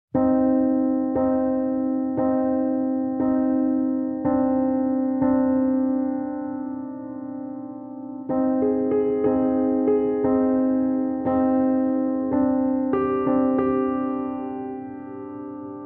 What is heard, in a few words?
French Waltz